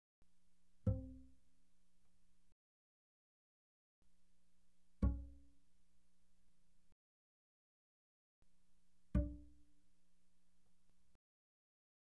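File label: Bass pizzicato mezzo forte sul G C4 G4, doublebass; Strings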